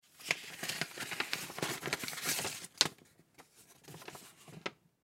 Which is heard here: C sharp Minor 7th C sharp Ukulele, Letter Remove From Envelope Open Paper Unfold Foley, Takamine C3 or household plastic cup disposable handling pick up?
Letter Remove From Envelope Open Paper Unfold Foley